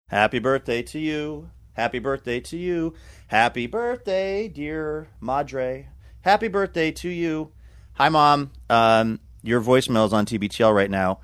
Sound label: singing happy birthday leaving voicemail message; mother